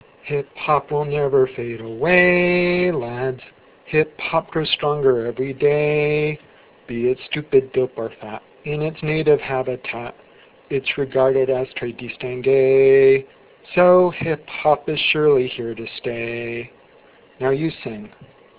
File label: anthem